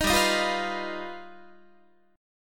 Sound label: G Augmented 7th, Guitar; strummed